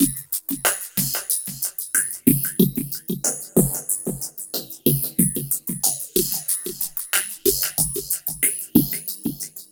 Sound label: RING HOP; Distored Reality